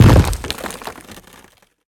creature wood footstep medium, Forest Creature Sound Effects; Bluezone